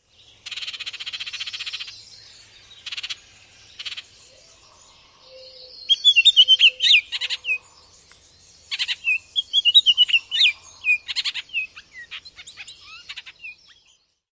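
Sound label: Baltimore Oriole chatter calls and harsh song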